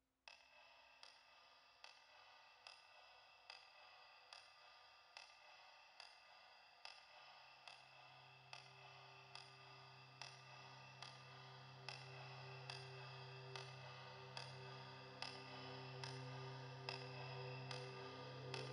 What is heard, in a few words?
Soothing White Noise for Infant Sleeping and Massage Relaxing Mindfulness Meditation Relax